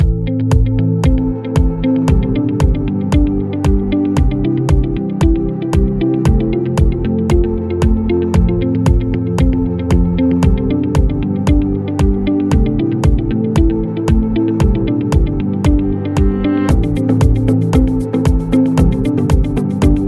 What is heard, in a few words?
Beautiful Corporate; corporate music vol 5